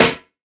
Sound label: acoustic snare sound a sharp key; snare drum samples